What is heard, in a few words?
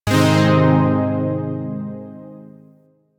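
Shawzin Minor Chord; Zariman Shawzin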